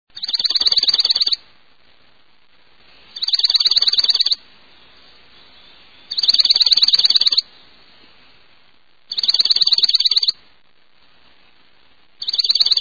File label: Dark eyed Junco